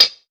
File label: original hihat sound D key, pedal hi-hat sample tuned to the D note; hits one shots; hat samples